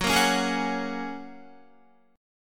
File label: Gb Major Add 9th, down, Guitar; strummed; JGuitar